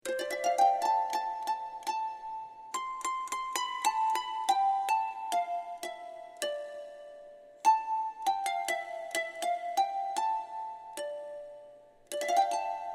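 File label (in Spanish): psalterio; brazo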